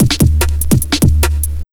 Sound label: AMB 147 LP1 L, Big Beat Drumloops; AMBIENT 147; AKAI